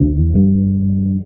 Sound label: bass, Loops Phonky